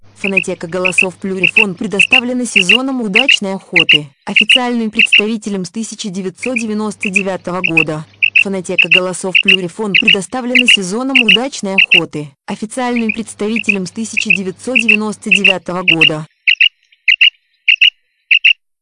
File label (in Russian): перепел; plurifon